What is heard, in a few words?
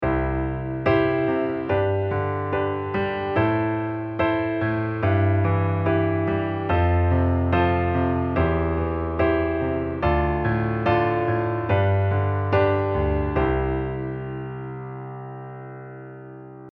LH chordtone